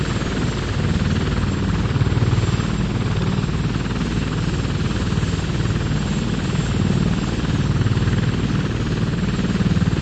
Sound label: apache engine work offload, tanks; War Thunder